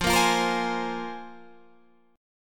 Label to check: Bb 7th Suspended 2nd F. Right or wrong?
Right